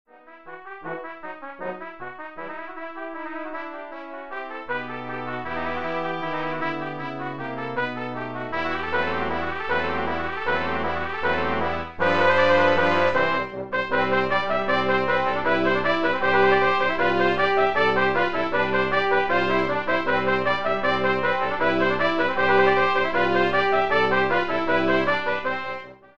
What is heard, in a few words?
farandole, trumpet